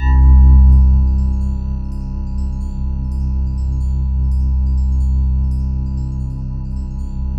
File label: C3, Synth Line; 90 s Sample CDs; NiceBeats; SynthLine VOL-24